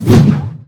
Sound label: slash